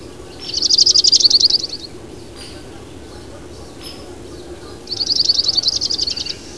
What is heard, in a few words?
dark-eyed junco